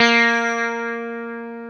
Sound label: EL SITAR A2; E-MU Formula 4000 Series Vol. 3; Analog Odyssey